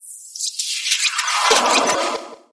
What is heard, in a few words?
Piercing Noise Transition